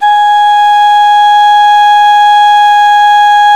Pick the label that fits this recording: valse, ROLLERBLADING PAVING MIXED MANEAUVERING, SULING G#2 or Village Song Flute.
SULING G#2